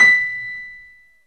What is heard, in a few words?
WARM PIANO